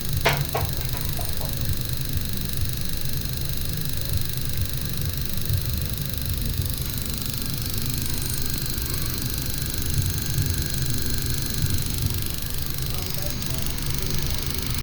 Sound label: London, Fieldrecordings